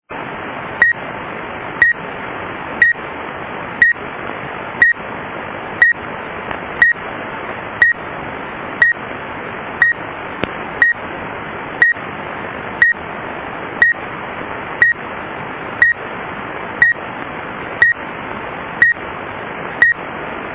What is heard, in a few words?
signal